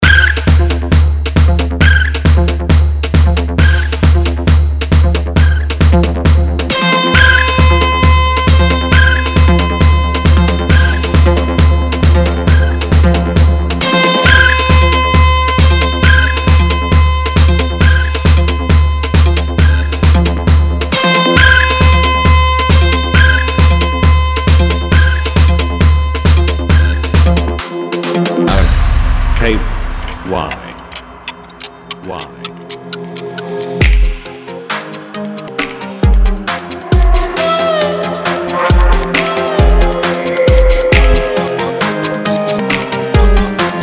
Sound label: Beat Competition Vibration Mix